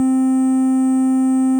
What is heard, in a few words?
Synth Bass, C3, Digital Chiptune 8 Bit